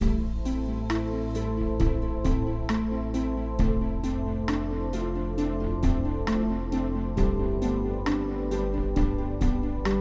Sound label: Sad chill; Bratz 4 Real